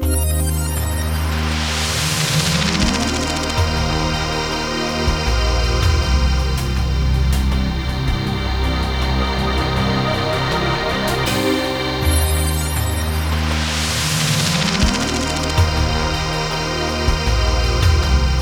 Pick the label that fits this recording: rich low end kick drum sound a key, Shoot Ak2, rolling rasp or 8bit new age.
8bit new age